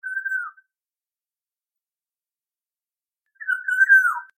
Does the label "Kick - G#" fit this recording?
No